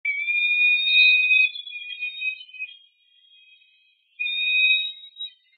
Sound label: Falcon Peregrine